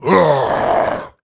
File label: male boomer pain, zombie; Left 4 Fortress